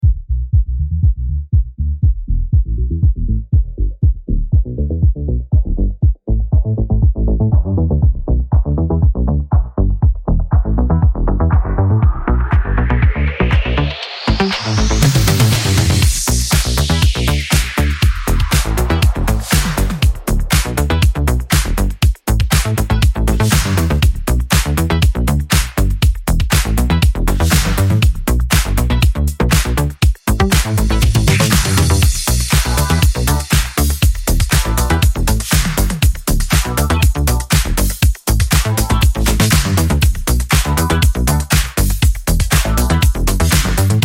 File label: Factory Beat